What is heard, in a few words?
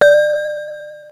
LOW BING; BEAT2000